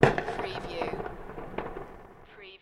Firework bang; beanstalk audio

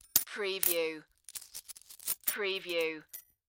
Coins Moving in Hand

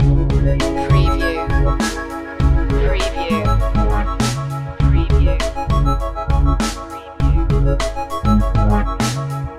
urban beat loop

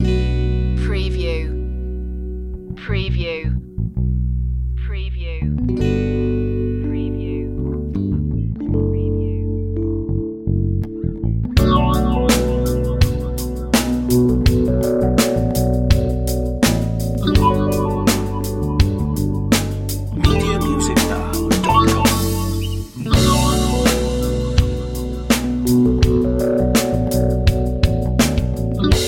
life grooves

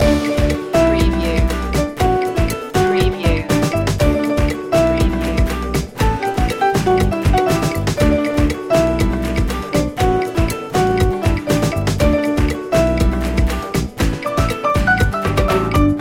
latin jump loop

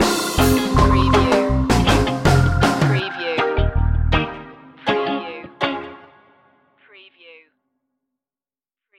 sunshine movement stinger

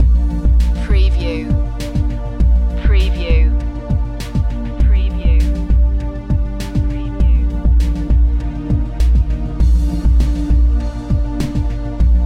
space age loop

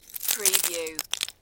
Snow and Ice, Bits of ice falling on ice

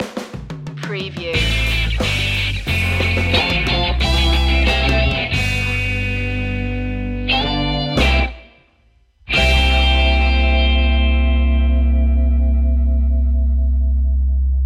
happy birthday funk stinger

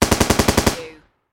Machine Gun M240B

